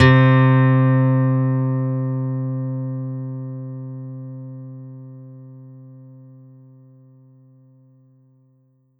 Korg 01W A Guitar C3

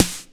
M-Audio Venom Snare Drum

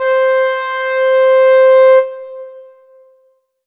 Ensoniq ZR-76 Wind Lead C5

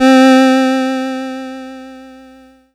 Yamaha PS-3 String C4

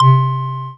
Casio MT-600 Fantasy C3

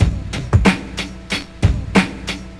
Ensoniq ZR-76 Dope

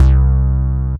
Yamaha FS1r Plastic Bass C2

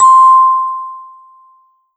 Korg TR Rack Music Box C6

